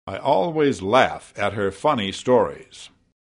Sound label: laugh